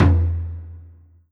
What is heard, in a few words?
Roland SC 88 Open Surdo